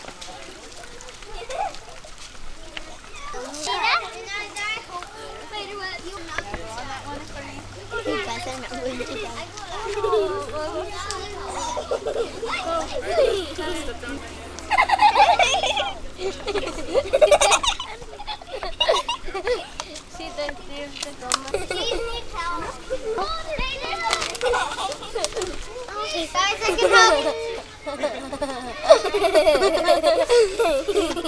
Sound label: Girls' Giggles, Creek Cross; Creek Crossing Giggles